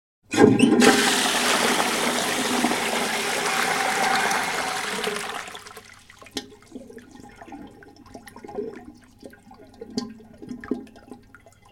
Toilet Flush; Soundzabound

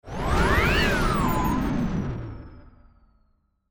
Ascend Descend

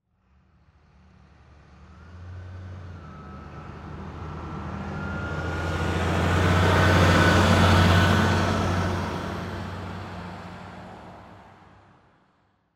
Car Drive By

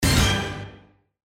Radio Musical

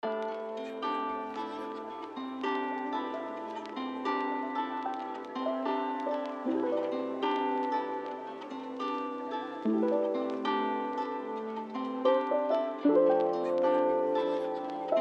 Chill-Out Session

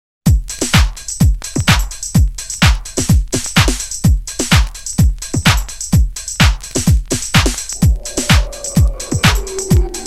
Houze Party, no vocals

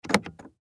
Car Door Lock

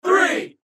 Crowd

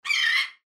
bird squawk

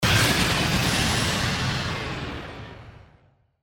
Blast Digital

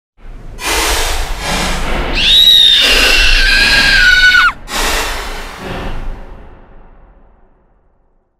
Horror Screamz; Soundzabound Volume 3 Disc 2

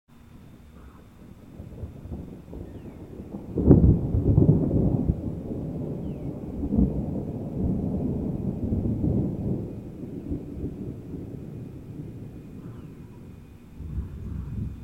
Thunderstorm; Soundzabound

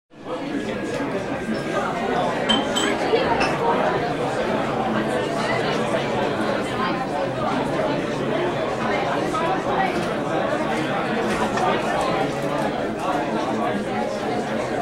Crowded Restaurant; Soundzabound Volume 3

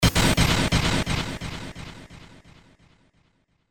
Blast Delay

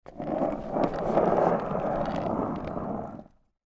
skateboard

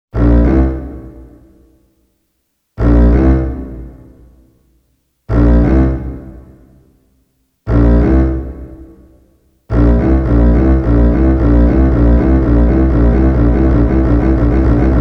Shark Attack with a bite; Soundzabound Volume 3 Disc 2

